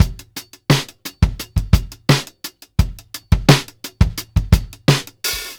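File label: 86 bpm, beat loops; Zero-G; Monster Beats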